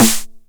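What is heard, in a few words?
909 SD Tape, snare drum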